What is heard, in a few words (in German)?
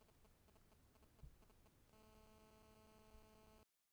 Funktest iPhone 50cm Schoeps CMC6 Mk4